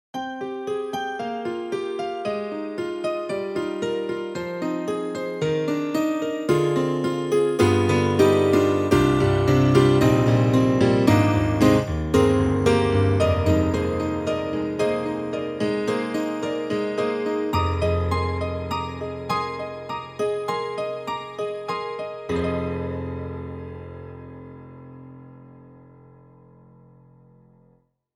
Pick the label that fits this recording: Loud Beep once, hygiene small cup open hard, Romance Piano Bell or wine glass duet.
Romance Piano Bell